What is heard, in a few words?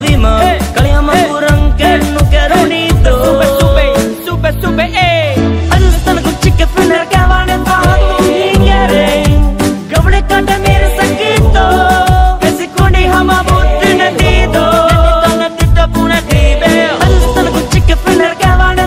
maldivian ringtone